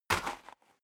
step boots gravel light single right; schritte

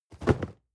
cardboard box medium fall low soft; objekte

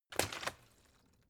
bottle water sparse shake single soft; berufe

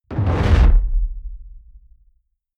heavy sub noise smooth, hits impacts; whooshes rises

